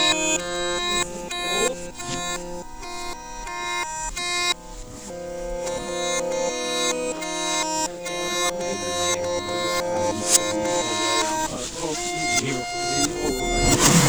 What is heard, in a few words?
foley